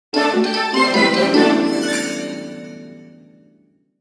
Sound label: Win Mini Game